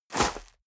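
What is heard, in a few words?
step dress boots gravel medium jump, footsteps; schritte; menschen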